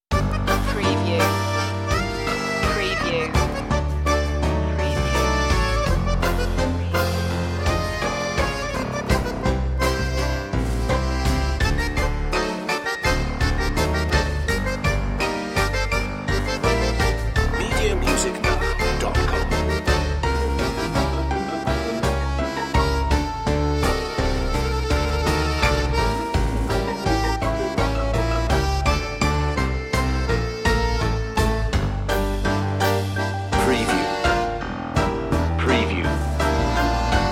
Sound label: circus tango